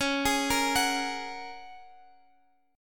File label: Bb Minor 7th Sharp 5th